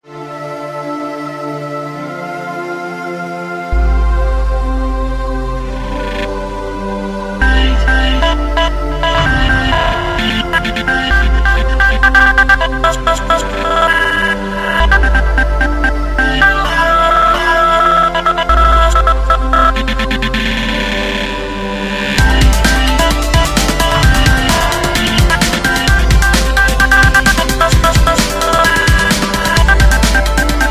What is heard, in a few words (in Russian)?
rington